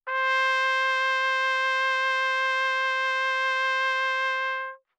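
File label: Trumpet vibrato ff C4 stereo, Bb Trumpet; MIS Pitches - 2014; Brass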